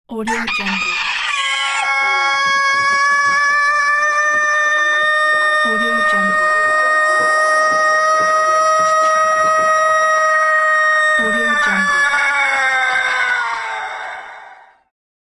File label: rooster crowing